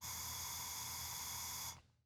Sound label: Industry Tool Torch Blow Torch Gas